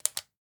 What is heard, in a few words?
sparkle click